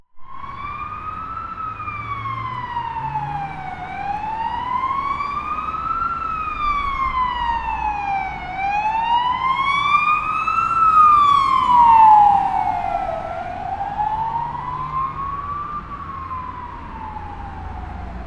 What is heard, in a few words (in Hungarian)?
vaci ut, mentóauto